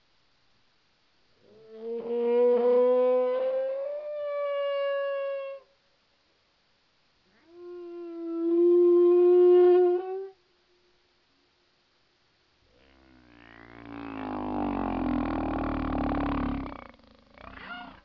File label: whale song phrase, Hawaii humpback whale